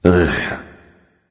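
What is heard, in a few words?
non-verbal ugh; smite; voicepack; houyi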